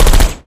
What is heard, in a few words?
turret fire 4x